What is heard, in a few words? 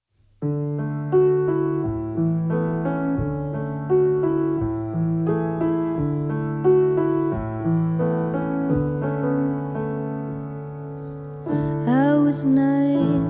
Acoustic